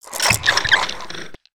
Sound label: Tiki Torch Shake